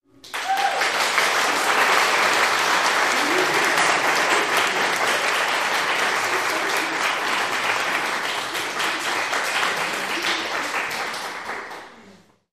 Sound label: small audience clapping intensive